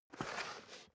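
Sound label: step boots concrete slide long, footsteps; menschen; schritte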